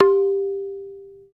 TABLA HI

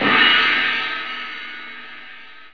splash cymbal; Drum Hits; CYMBALS

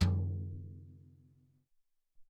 Brs Tom, Alesis SR-18

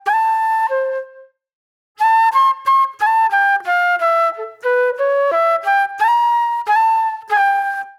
Reflective Flute

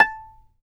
fiddle pizz A5 L; Sampleheads; Whole Lotta Country 1 & 2

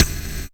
percussion, Dance Synths; Mad Kit

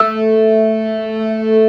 ORGAN SYNTH; InVision Interactive; Keith Emerson

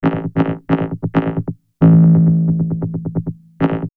Vocoder Loop; X-Static Goldmine; VocoderLoops

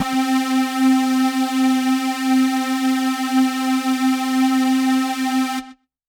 Synth C, Synth Prophet One Shots; Electronisounds

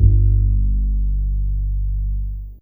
Viol Pizz G G2, Fairlight CMI IIe Pizz Str

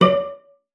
CELLO FINGER; 90 s Sample CDs; Xsample VOL-5

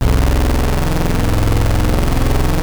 Prophet 5 F1; Hollywood Edge - Giorgio Moroder Rare Synthesizer Collection

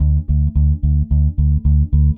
Eighth Notes D, Bass; Bass X

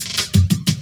LOOP SD, Drum & Bass, 180 BPM; Ueberschall; Drum & Bass Resonance

